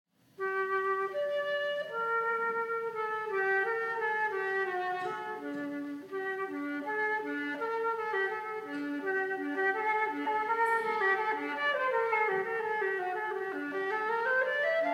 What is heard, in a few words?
Organ Works - Fugue in G Minor, Wind Quintet; Three Organ Works